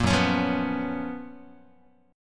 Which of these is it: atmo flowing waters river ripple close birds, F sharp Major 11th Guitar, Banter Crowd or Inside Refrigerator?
F sharp Major 11th Guitar